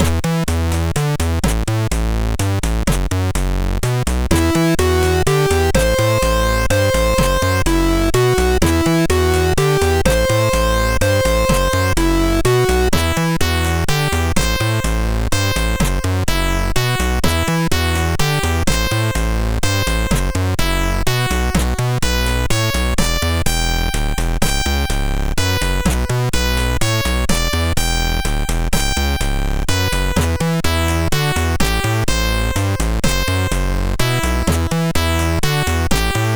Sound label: Zip, sid MOS6581R3; NVitia